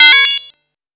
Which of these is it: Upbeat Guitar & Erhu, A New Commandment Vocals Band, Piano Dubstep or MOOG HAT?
MOOG HAT